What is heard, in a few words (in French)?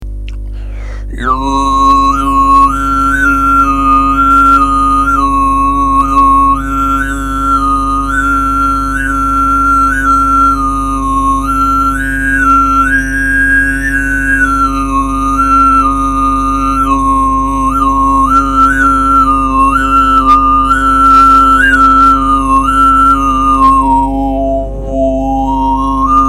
voix de gorge grave